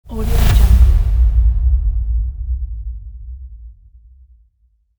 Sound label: dubstep hit transition